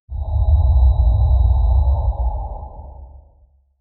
deep suspense sound effec

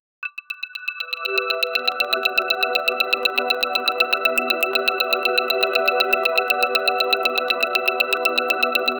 celestial tones ambient; clicking

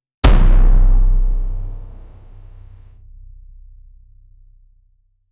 viking horn impact

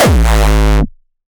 hard realistic punch; human actions

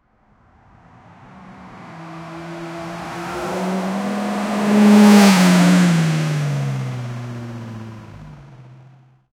tires screeching v8 car; take off

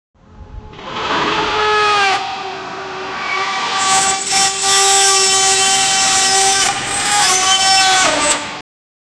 hellicopter swings and; horror

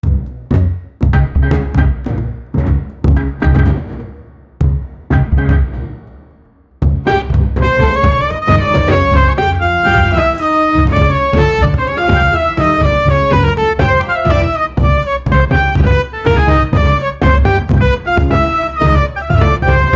halloween dance

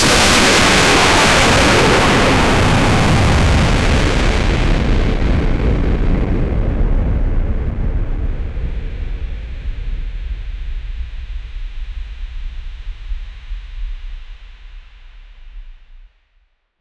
a cinematic lightning bla; superhero

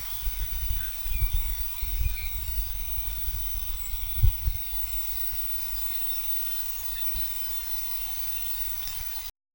clear digital taps no bac, digital

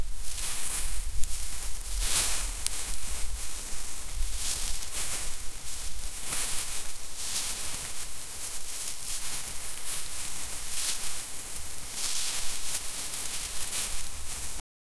the rustle of dry autumn

